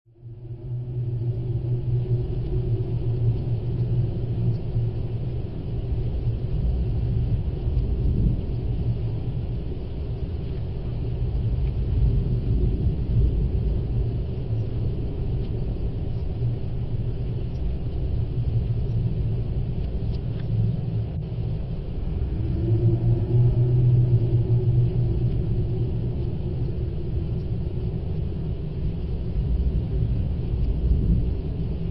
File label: eerie echoes dark ambience